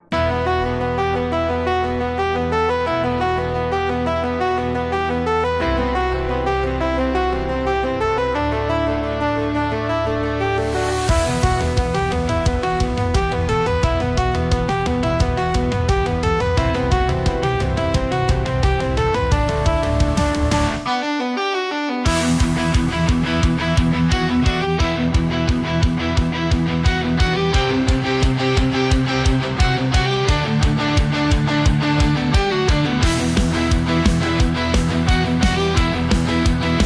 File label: instrumental